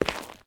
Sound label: zombie footstep concrete; DeadFright